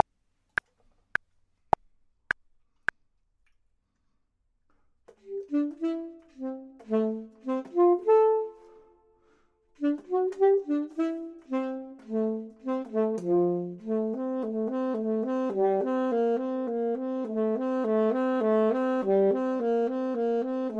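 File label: bottom, Saxophone and Clarinet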